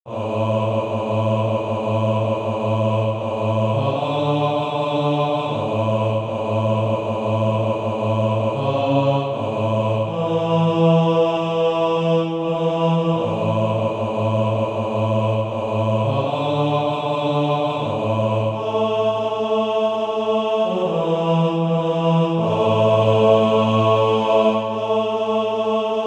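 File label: bass; SATB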